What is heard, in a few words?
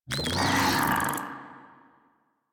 Desert Skate Vocal Attack D; Warframe